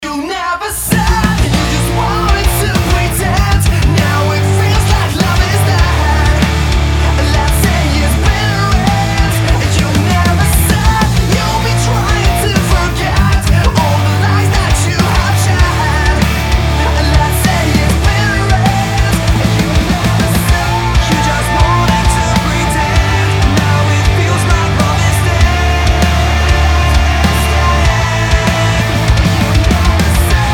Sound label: MIX MKIV KEMPER